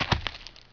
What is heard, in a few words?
Footstep Leaves